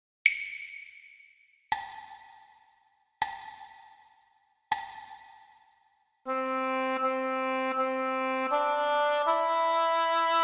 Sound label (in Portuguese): percepcao ditado